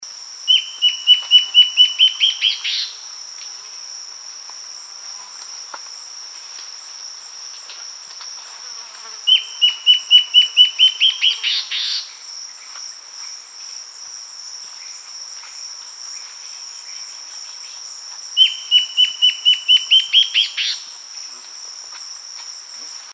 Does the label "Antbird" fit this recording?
Yes